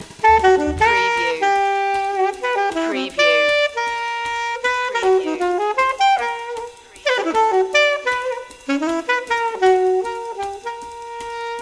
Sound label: alto leaps loop